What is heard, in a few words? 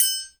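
triangle; Spectrasonics; Liquid Grooves 1 & 2; METALS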